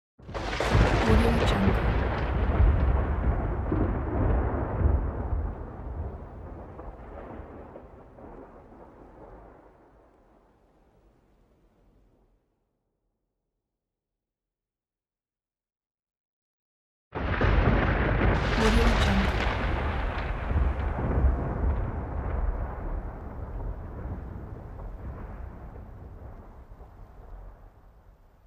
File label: thunder